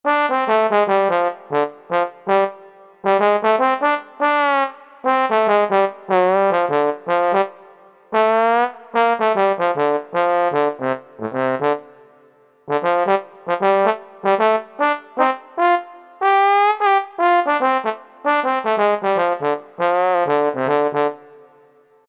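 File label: brass trombone; E-mu Proteus FX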